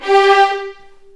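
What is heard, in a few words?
G4 violins; The Ultimate String Collection; East West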